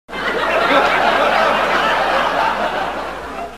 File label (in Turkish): gulme efekti